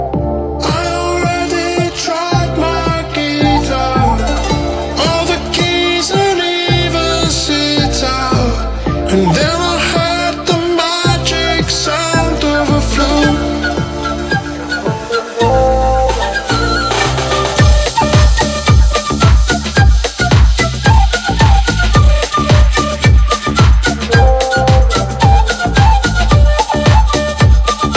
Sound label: blow my flute